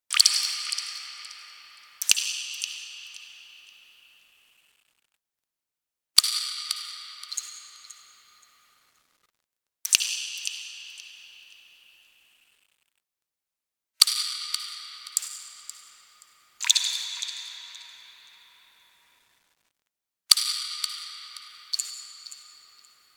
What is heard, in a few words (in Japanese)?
水滴の音